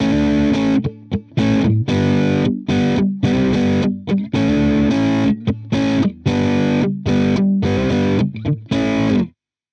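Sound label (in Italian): Esempio senza equalizzatore, chitarra; Equalizzatore grafico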